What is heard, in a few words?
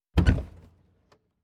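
Mitsubishi Eclipse GSX door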